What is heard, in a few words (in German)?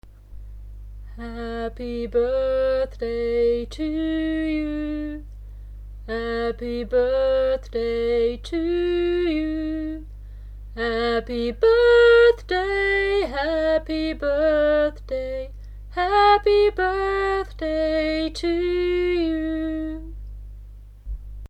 Klare Stimme Happy Birthday mit klarem Klang; Gesang